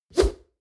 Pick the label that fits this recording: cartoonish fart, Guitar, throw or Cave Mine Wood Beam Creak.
throw